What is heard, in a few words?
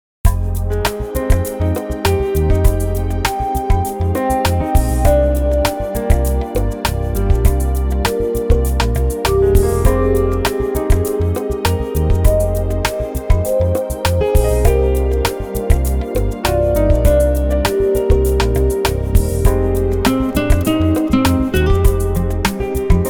Sweet Relaxing Jazz